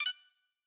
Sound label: Turret Correct